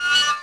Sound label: metal creak small